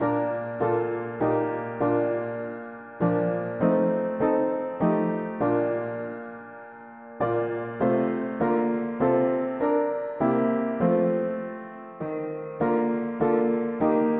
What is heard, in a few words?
mono piano; Nous cherissons le souvenir; hymns